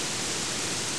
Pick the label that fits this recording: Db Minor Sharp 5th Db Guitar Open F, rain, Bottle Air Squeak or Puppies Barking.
rain